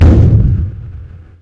bump

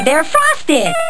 death; openffa

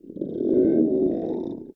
growl; hell

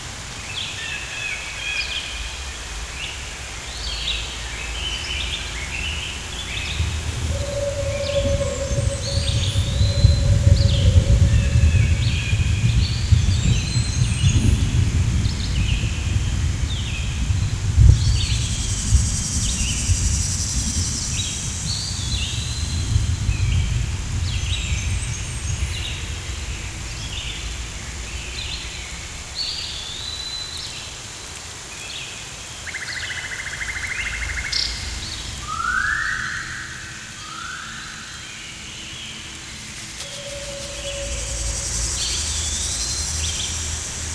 jungle

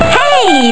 pain; openffa

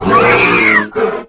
mutant pain

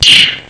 fall; Darth Maul

